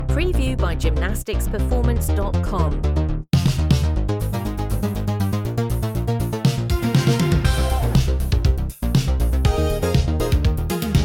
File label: 80s pop dance